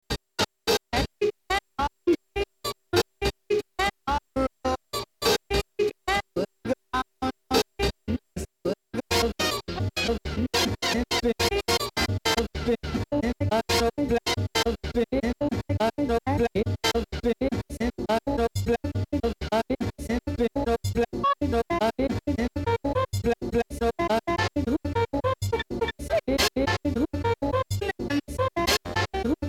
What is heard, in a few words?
Orch